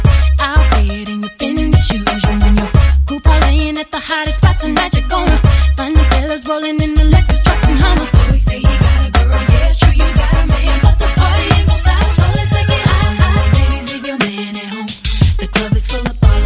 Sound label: pop